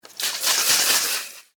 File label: Pesticide Can Shake, Extermination, Pesticide, Can, Shake, Insect, Bug; Sound Effects:Animals:Insects; Blastwave